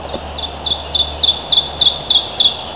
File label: ovenbird; natureNavigator